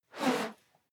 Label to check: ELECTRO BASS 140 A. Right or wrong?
Wrong